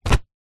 office plastic document folder wallet set down surface